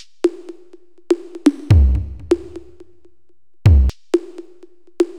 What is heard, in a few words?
E toms; Music Store Sound Service; Einstein